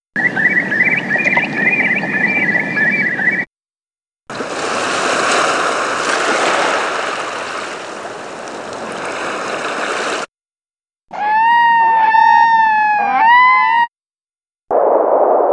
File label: Ambient; Demo Samples from Ambient; Time + Space - What's Next!